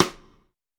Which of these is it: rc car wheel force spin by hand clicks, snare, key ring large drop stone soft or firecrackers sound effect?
snare